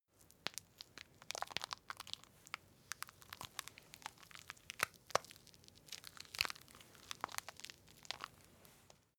shaving cream squish

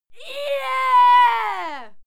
female cheer yeah; schreie; menschen

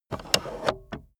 car accessories cup holder extend; autos; verkehr transport

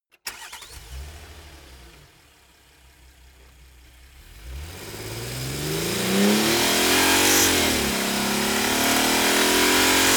car accelerate engine porsche cayenne

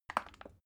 bottle syrup upend bright; berufe; gastronomie

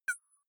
click rough high tonal, signale

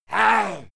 male fight attack; soundpool

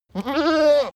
goat baa calm; natur tiere; ziegen

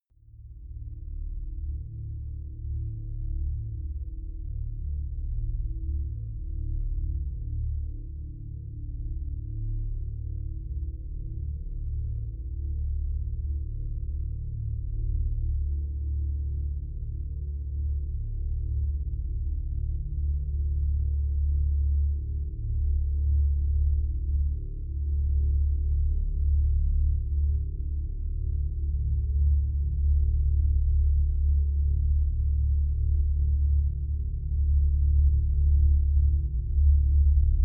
drone slowly swelling

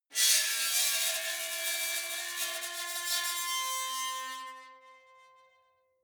metal squeal bright; metall

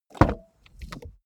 car bmw e46 exterior door rear open; Cars

